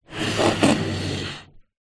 foley balloon blow up inflate squeak

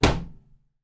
household bathroom cabinet door close

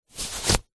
mask fabric sleep eye remove

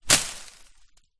impact stick throw into bushes hard

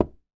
household wall partition hollow knock x1; sound effects one

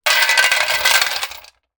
industrial chain med place down on metal surface; zapsplat

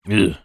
human voice male ugh grunt disgust

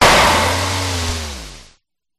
Glitch Decimated; Glitch Factory 01; sound spark

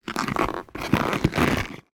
foley rubber object movement; sound effects one

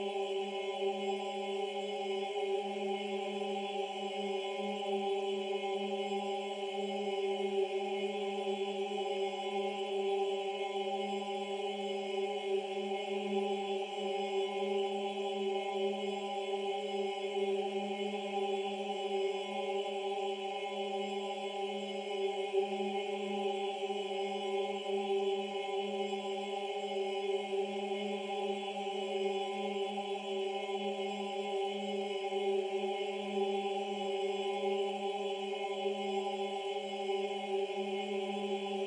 Drone dissonant vox hum with metallic whistles

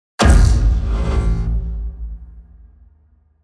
Impacts designed; soundbits

